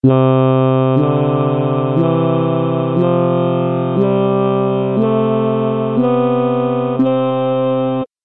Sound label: scale ET